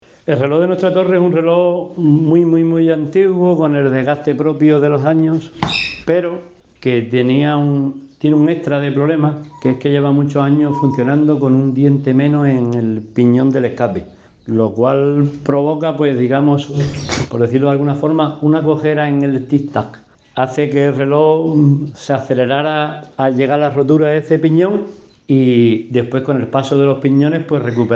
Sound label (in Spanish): RELOJ IGLESIA; reloj de la iglesia de valverde